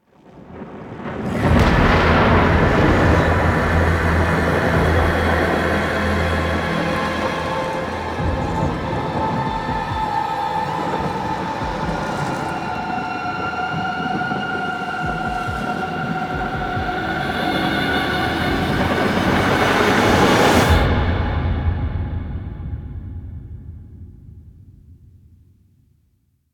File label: Intro SFX; gameplay; Warframe; NineteenNinetyNine; Stage11FuturePast